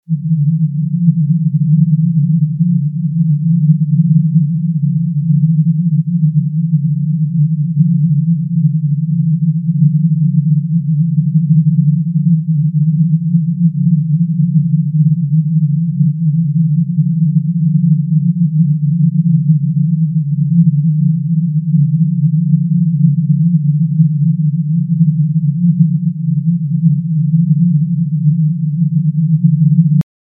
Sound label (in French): bruit rose